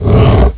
box push; Nokia; 5800 XpressMusic; Bounce Touch

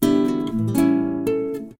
Guitar

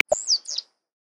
Green Warbler-Finch, Galapagos; Xiaomi Redmi-8A

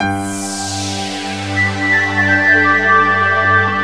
ringtone, newage; Google; NewWave Labs

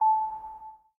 popping colours tap

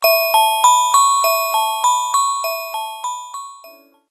Pattern Bell, phonetones, Motorola; Karma-QA1

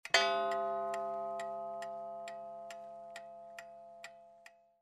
sms; Huawei C5589

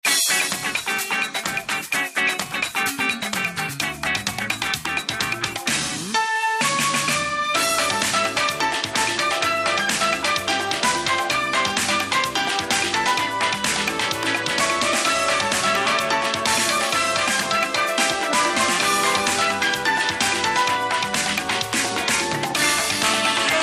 Latin Party

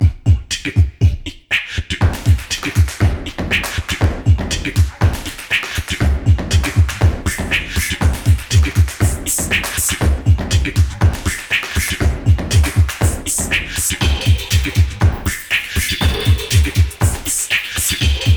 Beatbox; Pantech Discover P9090; alarms

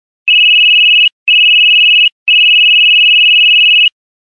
Loud Beeps; LG VX11000 enV Touch

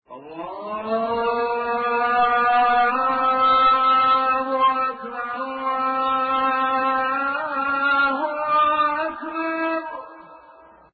azan; Spreadtrum

